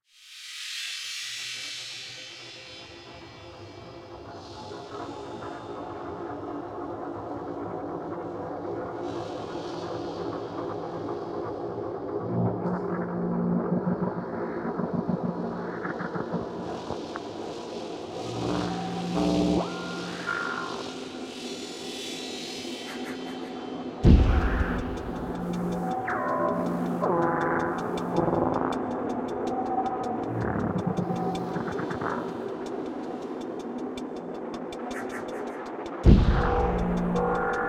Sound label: Tension Background; The Park